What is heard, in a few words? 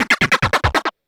Industrial 140